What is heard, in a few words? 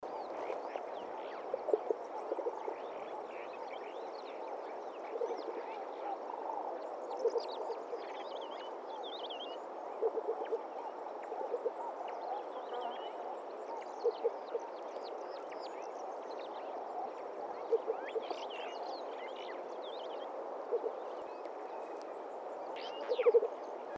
Kori bustard